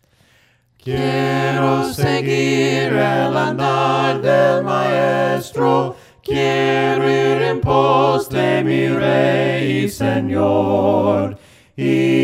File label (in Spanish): VOCES